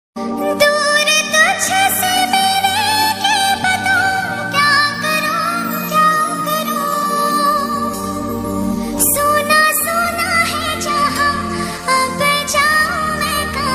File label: ringtone call ringtone hello ringtone caller tune mobile ringtone